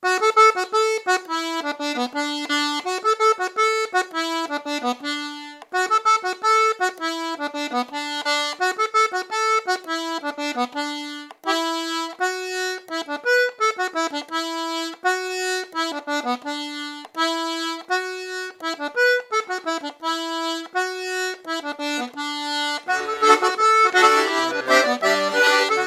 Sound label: two step scottish; ateliers diato 2; L'atelier de la casserole